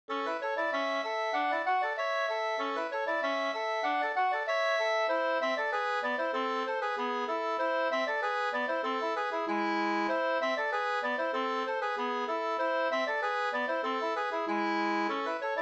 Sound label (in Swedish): halling; fr Lysvik Vr Dybeck SvFm99 2st